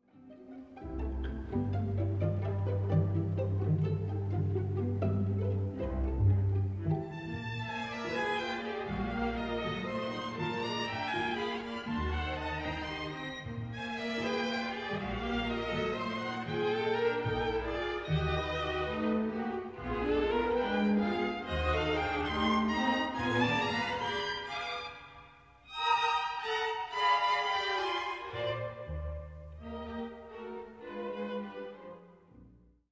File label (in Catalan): Orquestra Corda; Danzas concertantes americana